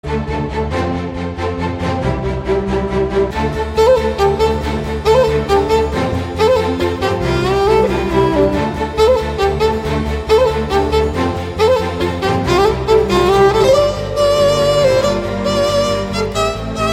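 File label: LOVE Theme background music; Ayothi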